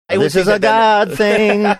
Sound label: singing this is a dad thing